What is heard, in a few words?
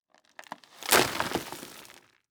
Impact Dirt Dump On Plastic